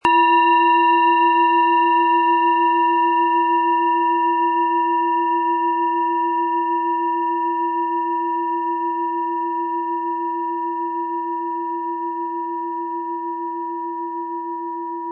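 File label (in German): Planetenschale Platonisches Jahr & Mond, Ø 13,5 cm, 400-500 Gramm inkl. Klöppel; Planetenschale® Weg in die Trance & Sich selbst helfen können mit Platonisches Jahr & Mond; Sound-Spirit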